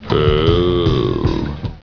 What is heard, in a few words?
ohhhhh; Anchorman